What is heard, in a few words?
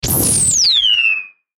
Descend Bright Ring; Descending Sound Effects; Descends; Blastwave